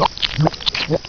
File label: gurp; kenny